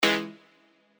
Synth Hit C#

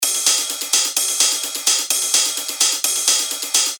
128 BPM

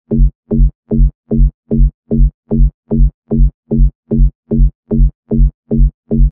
Reverse Bass Loop F# (150 BPM)

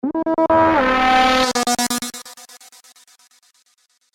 Synth Fills B (128 BPM)

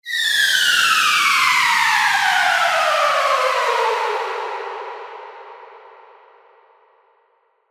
Downlifter

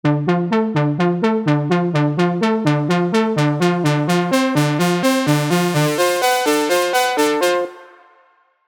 Heave Tune; Serum

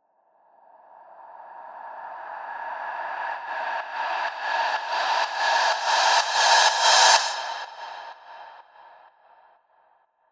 Uplifter 4bar (125 BPM)

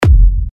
Techno Kick F

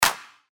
Claps; tech house

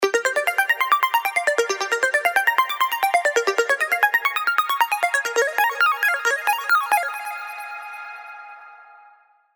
Serum arps; Belissimo

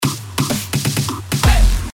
Drum Fill (128 BPM)

